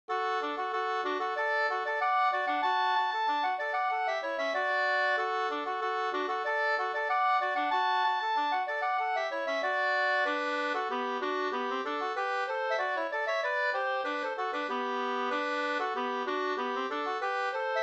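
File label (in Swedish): anglas 2 stämmor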